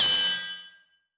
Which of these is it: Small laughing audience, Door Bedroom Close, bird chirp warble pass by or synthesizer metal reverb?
synthesizer metal reverb